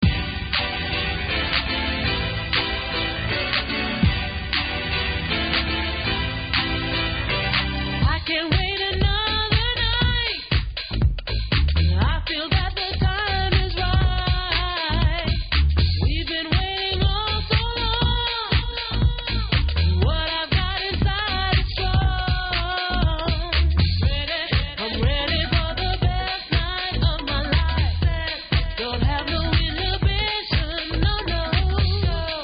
eurohouse

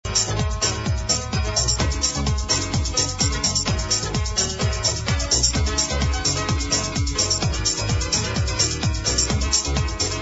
piano mix with no vocals

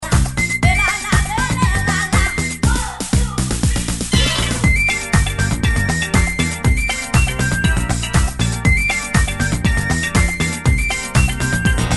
groove version

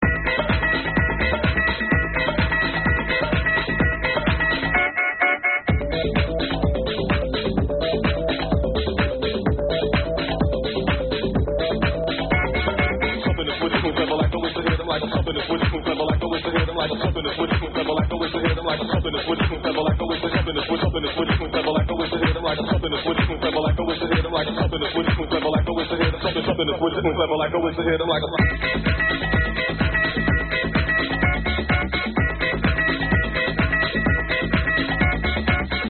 old techno

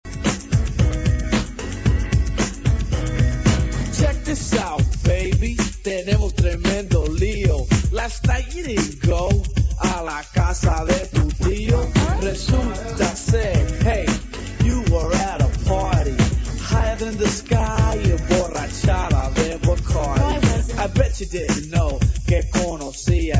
latino hip hop

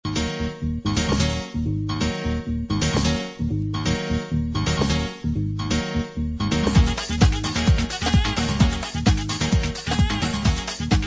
salsa

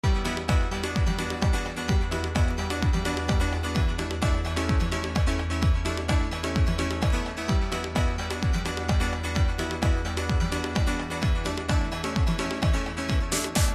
tune, sad chilled out piano track

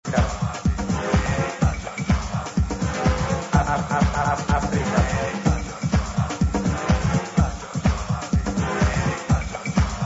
tribal house

